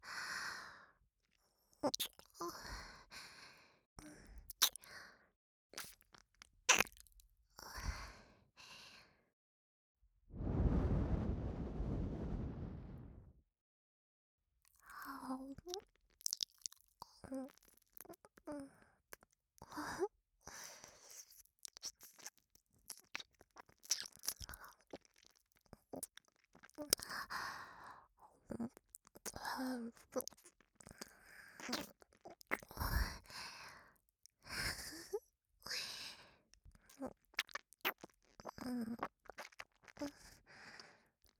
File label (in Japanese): 耳舐め（左; 効果音あり